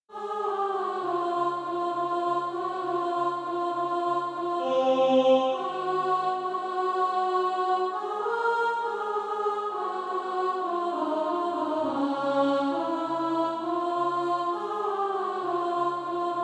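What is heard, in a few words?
alt, Practise Music; Christ Has Arisen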